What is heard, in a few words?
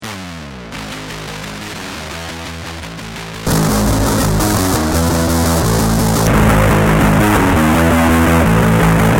bass big muff; deathbyaudio